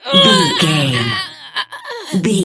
voice female death

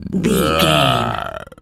zombie voice grunt

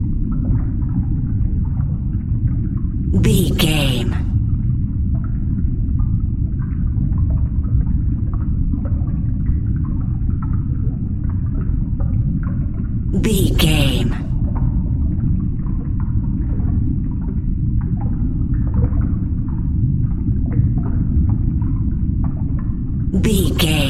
underwater sea diving bubbles loop

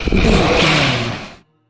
troll monster breath

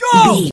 voice male battle shout short

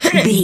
voice female attack

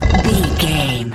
rock door slide block move drag

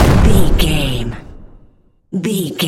explosion large

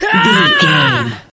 voice female battle shout short

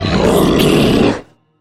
troll monster growl

